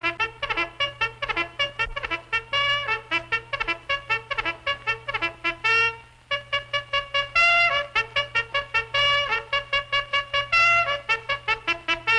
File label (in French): reveil militaire